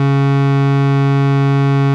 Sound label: C#2, PRO 600 WAVE; AKAI S-Series CD-ROM Sound Library VOL-7